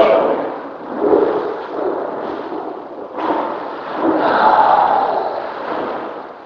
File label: LOUD SIGH